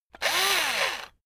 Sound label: electric drill screw out short; soundpool